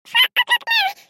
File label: clock